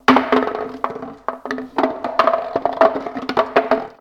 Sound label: Bone Stn Roll